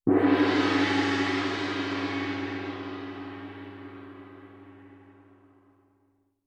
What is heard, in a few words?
Small Gong Hit